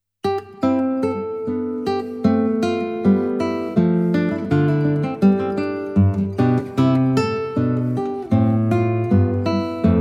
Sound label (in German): Bourree und Double, Guitar